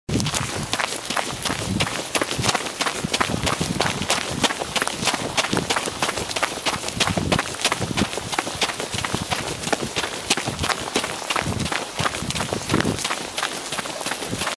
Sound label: running on dirt road; spinopel; treasure trails